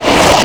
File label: Glue Splash; Vehicles